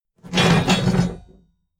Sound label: metal scrape big phase